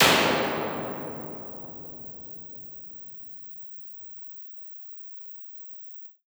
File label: EMT 140 bright; IR; Plate